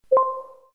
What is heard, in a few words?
Bt Request; ZTE E520-Agent